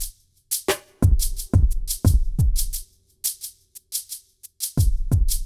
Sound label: bad mood beat 88